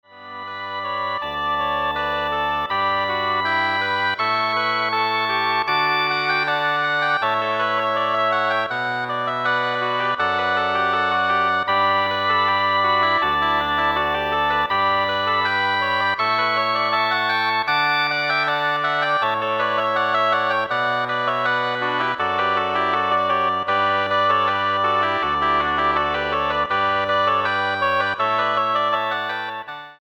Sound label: Double Reed Ensemble